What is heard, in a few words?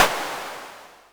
noise